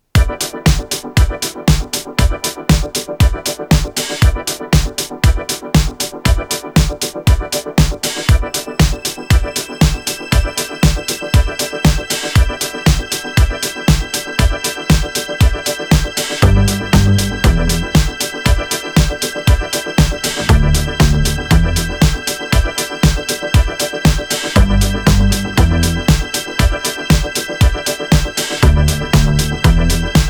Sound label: instrumental